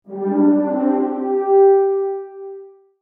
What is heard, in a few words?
quest complete; music; stingers